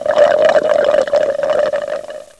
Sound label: SIPPING, HUMAN